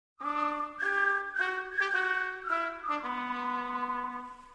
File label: Song Notification; Pathfinder